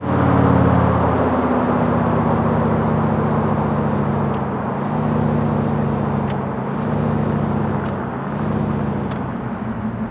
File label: throttle off fast, vehicles; schafter